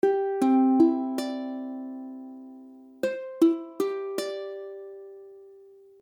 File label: Cadd9, ukulele; ukulele akordy